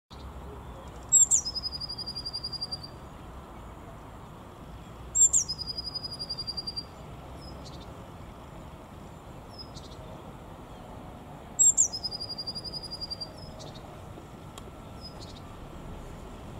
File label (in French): MESANGE BLEUE chant